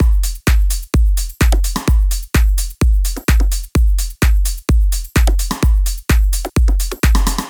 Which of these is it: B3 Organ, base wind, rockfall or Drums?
Drums